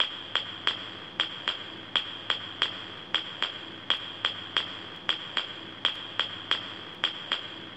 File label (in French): Claves